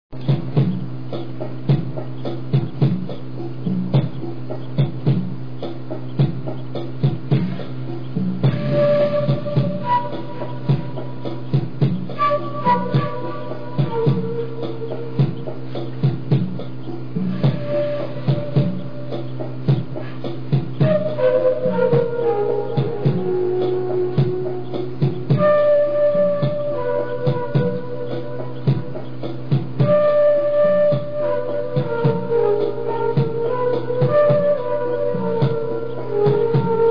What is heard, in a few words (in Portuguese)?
batida e sopro